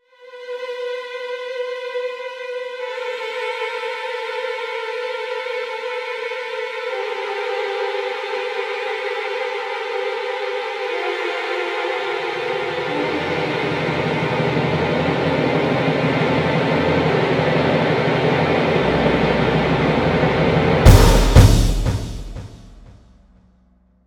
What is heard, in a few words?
trills